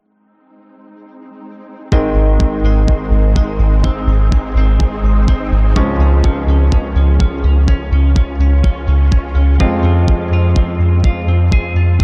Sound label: Medical Background